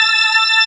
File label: SYN ORGAN, dance synth; Sound & Vision - Gigapack I CD 2 (Roland)